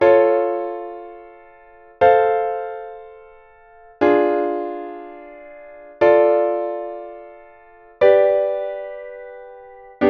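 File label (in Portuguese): Identifique a Triade; Identifque a Triade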